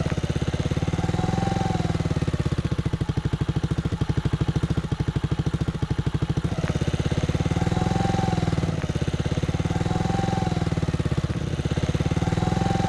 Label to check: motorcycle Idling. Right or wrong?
Right